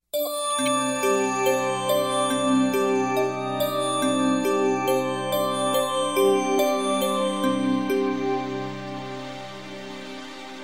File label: Clock